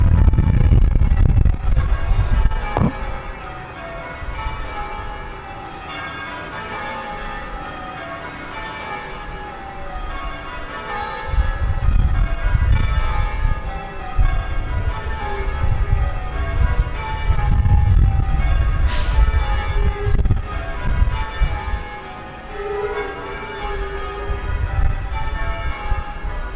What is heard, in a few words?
female bellringers ring quarter peal; at st john's waterloo for international women's day iwd